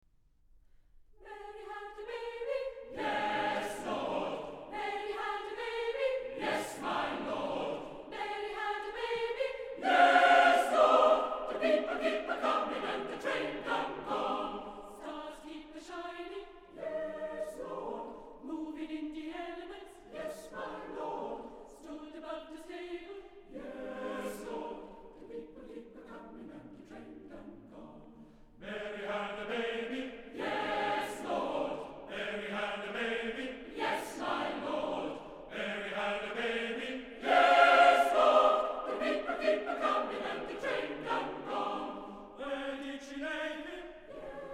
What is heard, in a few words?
Welsh Chorus, Christmas carols